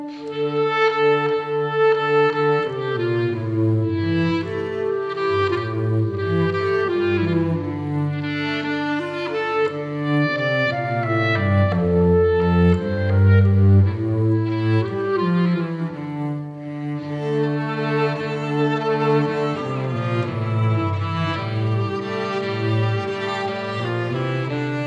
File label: Balalaika